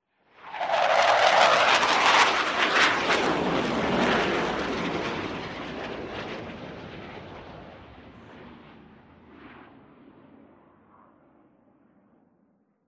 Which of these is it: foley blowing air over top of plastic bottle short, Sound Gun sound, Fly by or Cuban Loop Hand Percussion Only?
Fly by